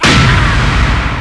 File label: ahhh